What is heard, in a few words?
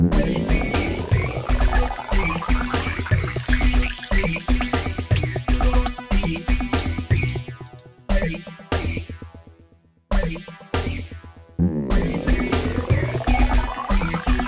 Weird Cartoon Groove